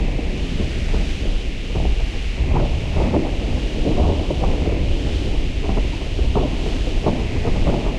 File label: shell fly, tanks; killscene